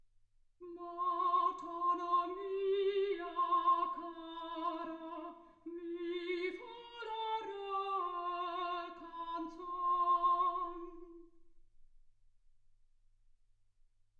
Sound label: soprano